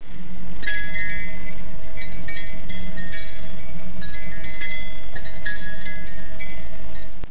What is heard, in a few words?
chimes